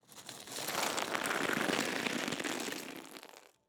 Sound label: Ice Debris Slide Big, Icy Snow; Soundopolis